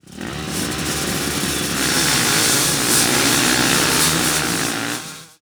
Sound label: Start, UI